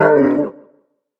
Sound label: monster roar short; soundscrate